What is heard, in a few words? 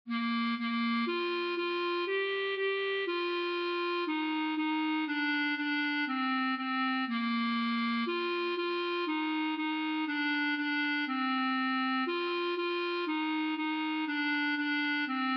twinkle, clarinet